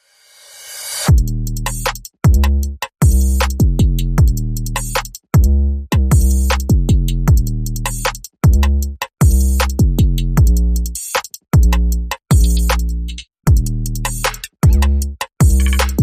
Drums and Bass

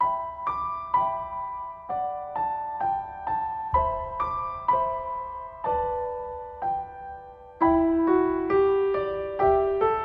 Sad Doll

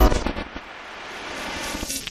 Static Interference Transition

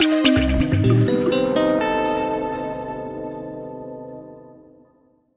Sonic Mystery

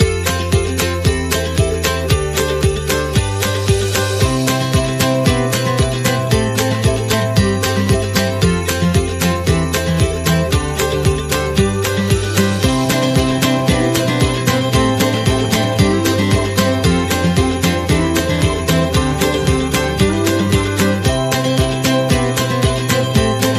Upbeat Acoustic Christmas, No Melody

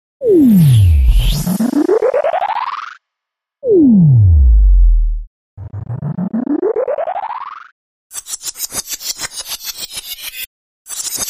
Dip & Drive Up Dip Drive up Slivers

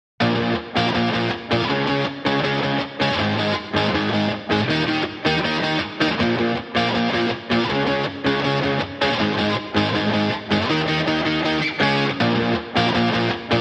Guitars Only

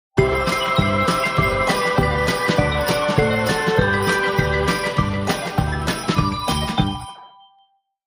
A Retro Jingle Bells

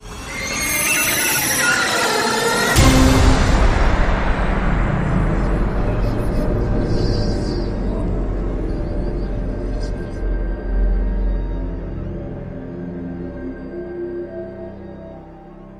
Hit (C)

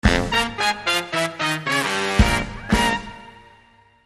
Brass Sting

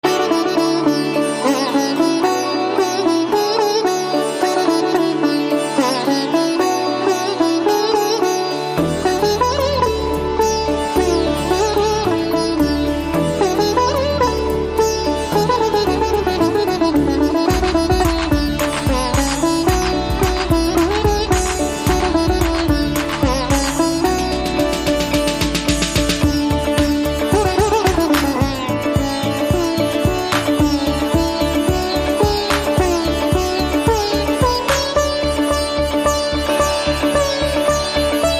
Fusion

